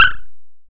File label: Jump; game-off-2016